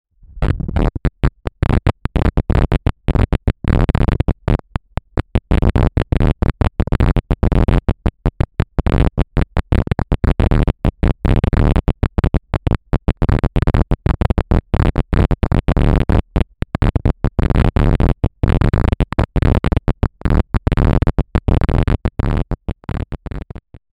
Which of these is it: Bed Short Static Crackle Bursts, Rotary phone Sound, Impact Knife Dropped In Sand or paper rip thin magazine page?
Bed Short Static Crackle Bursts